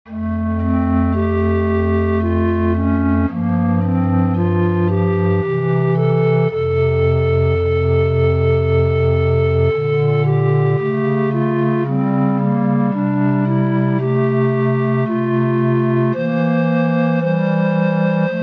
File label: meditation major